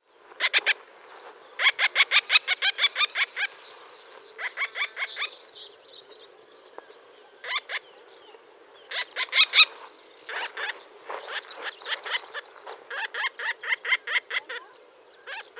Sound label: Falco rupicoloides, roofvogels; Grote torenvalk; Falconidae; Falconae